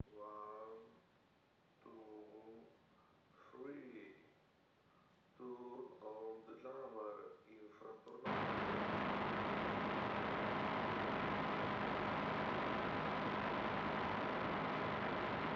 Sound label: Audio Jammer vs htc ONE X real test